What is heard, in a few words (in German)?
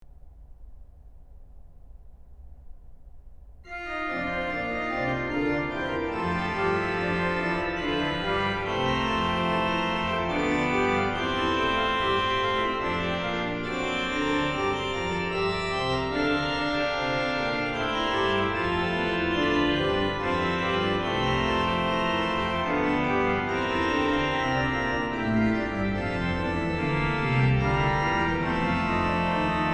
BWV 600 Orgelbuechlein, orgel; Litomysl; Gottes Sohn ist kommen